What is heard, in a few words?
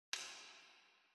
concert hall clap; ZahorikNeal